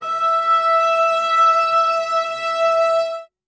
string acoustic, cello

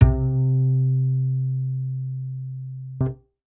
bass acoustic

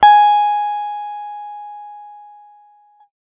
guitar electronic, clean electric guitar

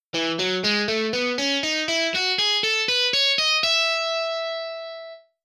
guitar scale; analyser samples

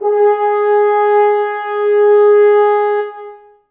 brass acoustic, muted trumpet

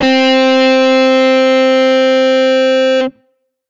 guitar electronic, distortion guitar

